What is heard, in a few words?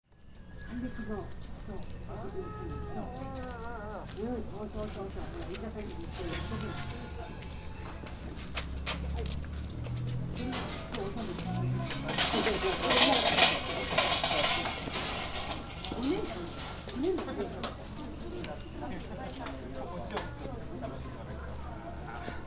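main shrine, Fukushima Soundscape; takahata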